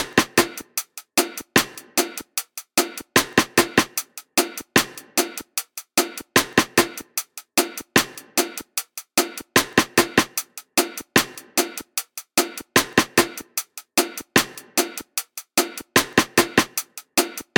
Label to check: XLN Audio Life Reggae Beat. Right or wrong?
Right